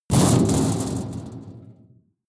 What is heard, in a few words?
metal impact sheet bright rattle hard; hits explosionen; metall